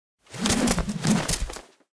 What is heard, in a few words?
Evade Backward SFX; Lust Princess